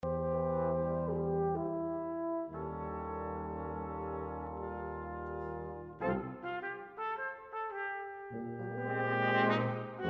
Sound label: christmas brass; christmas mudley